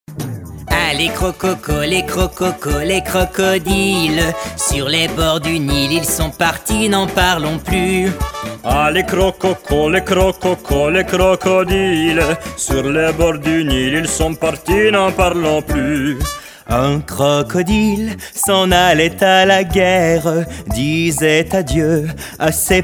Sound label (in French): Comptine